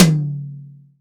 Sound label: NATURAL TOM, jazz